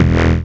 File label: Synth Bass; Vengeance Samples; VEE Synth Shots